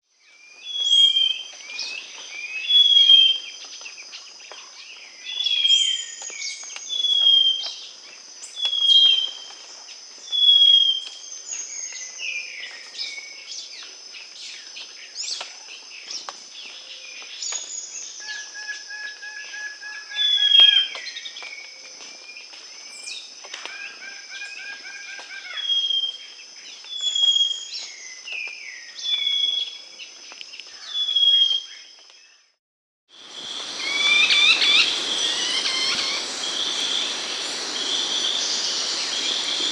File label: Tawny-Crowned Greenlet (Hylophilus Ochraceiceps), Andean Birds; S. America